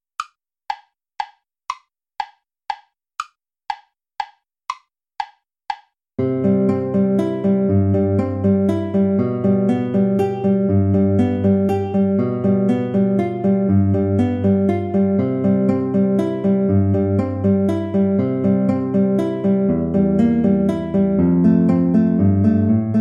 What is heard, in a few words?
traditional sweetly the bells are ringing play along